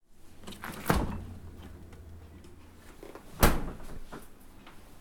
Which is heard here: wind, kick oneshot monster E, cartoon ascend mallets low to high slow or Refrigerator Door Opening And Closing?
Refrigerator Door Opening And Closing